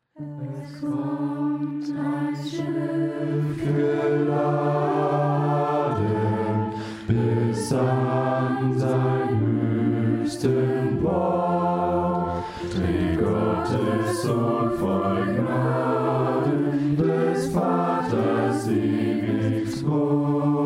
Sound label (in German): Es kommt ein Schiff geladen, Chor; Christophoruschor; Adventskalender 2020; rostock